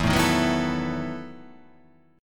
E Major 7th Suspended 2nd Suspended 4th

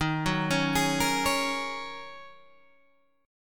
D sharp Minor 11th D sharp Guitar Open G, chord

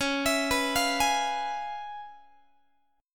C sharp 7th Sharp 9th Guitar, chord; C#7#9; arpeggiated; tuning=4A,4E,5A,5D,5G#,6C#

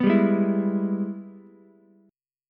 Ab Minor Major 7th Sharp 5th chord, Ukulele; strummed; 4,7,4,7; JGuitar

